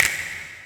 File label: snap